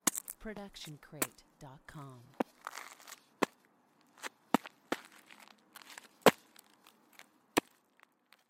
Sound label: walking dirt; soundscrate